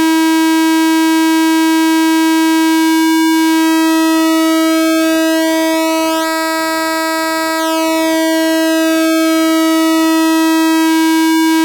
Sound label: cali osc pwm, synth